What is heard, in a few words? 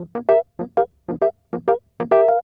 Smooth Groove Rhodes, 70s Analog Funk; Sonic Foundry (Sony Creative Software); What it Is!